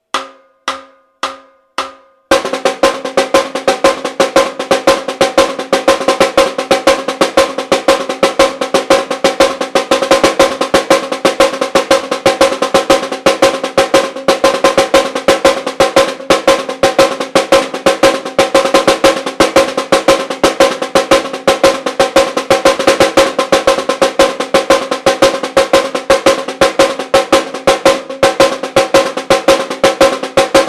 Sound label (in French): batucada caixas caixa 100 bpm variations